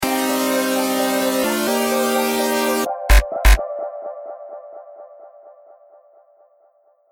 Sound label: lost round, music